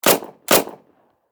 Warfare Submachine Gun Thompson 9Mm Double Shot Distant Perspective; Machine Gun Sound Effects